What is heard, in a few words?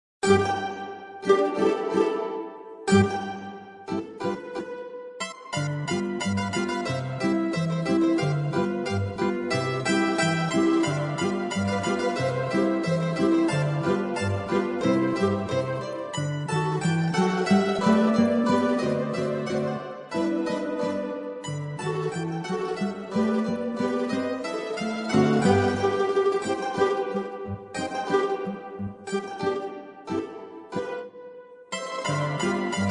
Pizzicato polka; Strauss, Johann